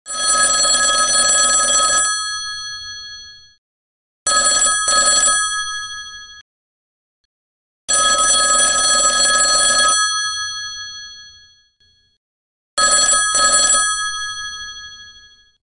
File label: old phone mixer